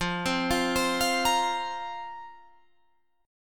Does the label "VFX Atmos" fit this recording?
No